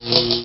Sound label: pain; droid